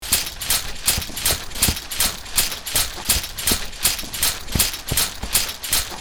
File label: Medieval knight armor clanking running